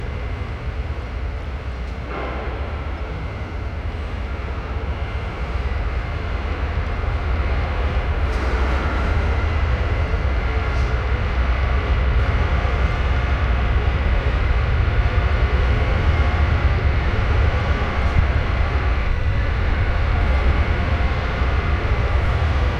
the shipyard where

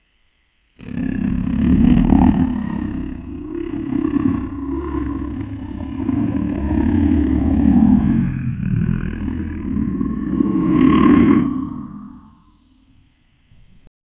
sound of t rex roar; dinosaurs

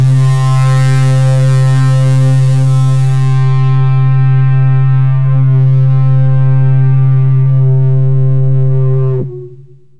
electiric wave, electric

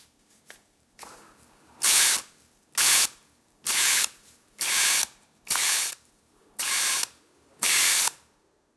household

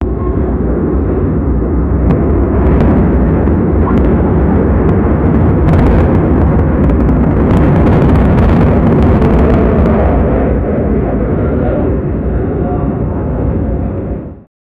a bug explosion of an, aircraft

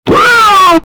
ta ta ta, drum

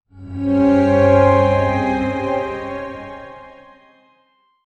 sound for logotype intro, branding

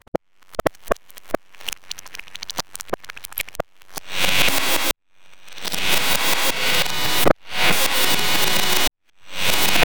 chaotic digital static

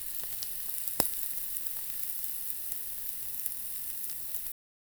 egg cracking sound shell

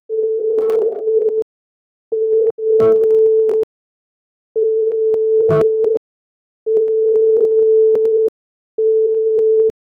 repetitive sound effect; artificial intelligence

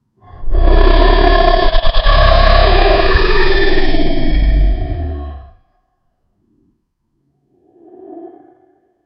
a sea dragons roar of, sea

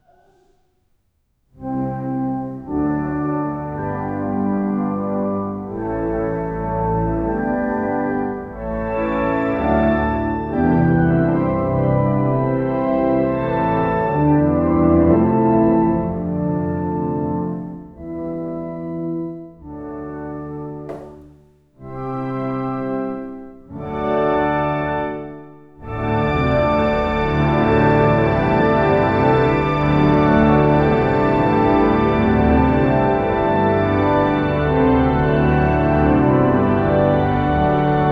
one person plays classica, instrument

